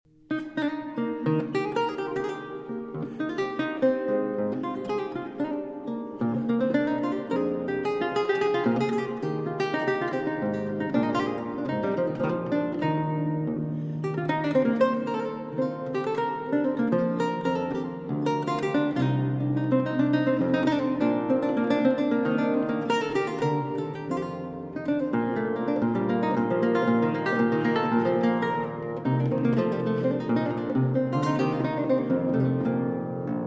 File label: Cello Suite in G Major, Courante; Cello Suite No. 1; BWV 1007 III.